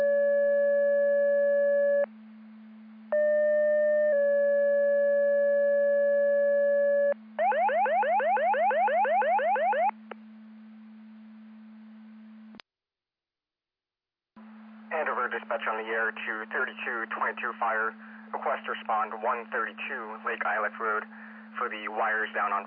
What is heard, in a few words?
Siren; Andover Twp Boro